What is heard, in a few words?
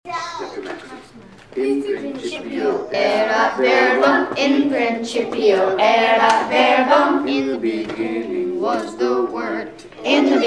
4th Grade Latin Chant